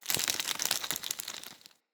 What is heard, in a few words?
Impact Burn Medium Flesh; Weapon Impacts